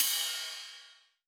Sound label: Rides, Cymbals